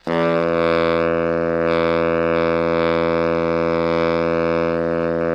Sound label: BARI PP E1, baritone saxophone; Greytsounds - Philip Wolfe David Crozier's Saxophones